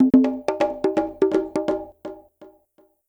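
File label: PERCUSSION DISCO 125; Drum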